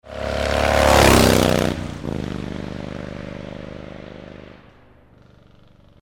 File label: SFX Cue To Call; Atlanticade 2017